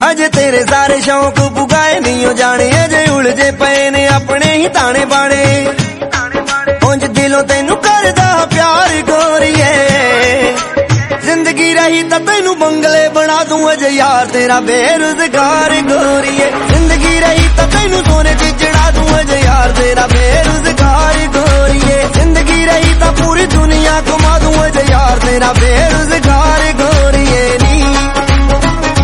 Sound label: punjabi ringtone